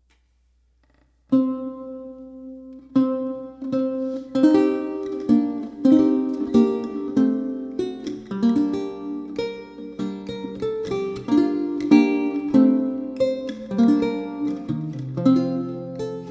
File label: Prelude, Lute; Pierre Phalese